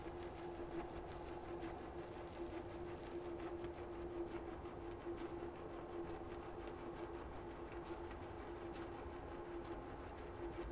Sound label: HYDROPHONE 16000Hz; JASCO AMAR HYDROPHONE 740